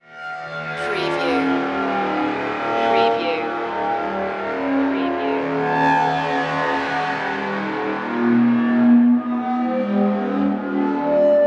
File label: creaker